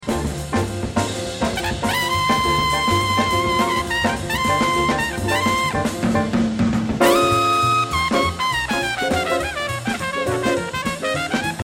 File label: fill high note